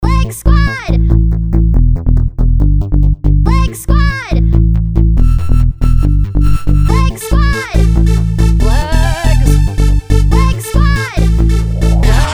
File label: Techno